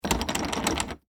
Door Knob Shake, Foley, Door, Knob, Shake, Hollow, Wood, Basement; SoundEffects-BlastWave